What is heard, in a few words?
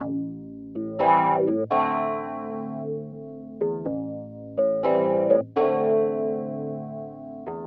organ house 125 A; eJay; Keys